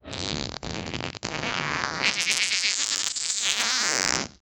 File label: cartoonish rubber stretch